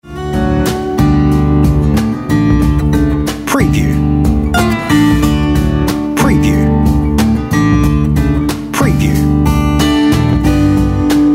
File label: On Hold Music Acoustic Chill